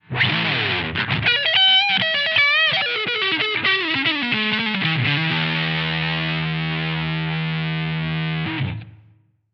liquid blues full tint; Damage Control

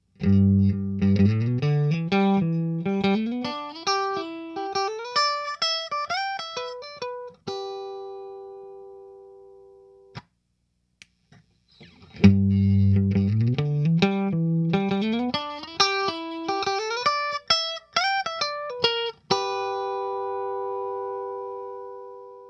Compressor OFF then ON; EMMA Transmorgrifier

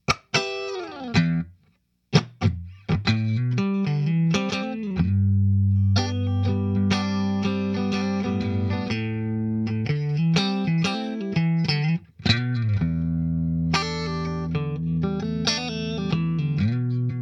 EMMA Transmorgrifier Compressor ON

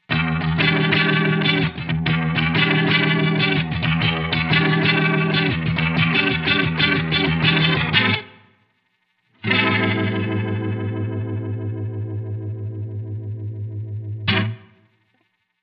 glass nexus lesrv; Damage Control

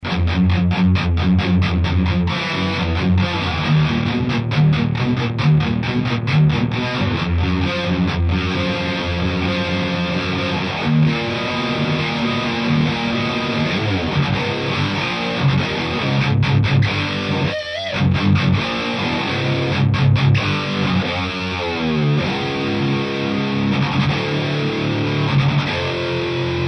medium gain, AMT P1 Legend Amp Series; AMT Electronics

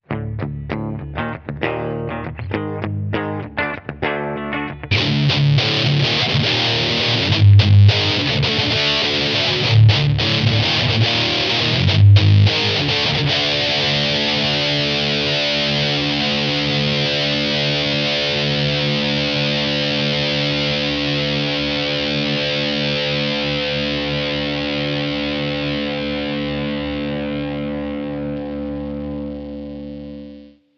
solid metal; Damage Control

